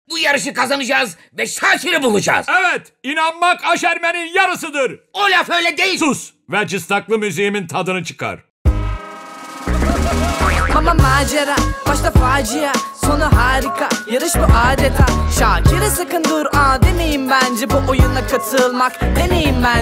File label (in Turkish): rap sarkisi karaoke; Cartoon Network; nakarat yok; Kral Sakir